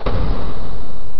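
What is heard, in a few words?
DOOR CLOSE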